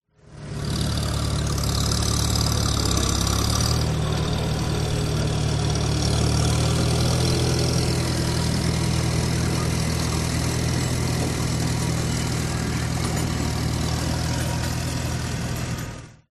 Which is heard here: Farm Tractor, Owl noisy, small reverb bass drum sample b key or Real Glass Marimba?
Farm Tractor